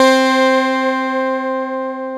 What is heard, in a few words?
MKS80 SPRANG; Rubber Chicken Software Sample Bank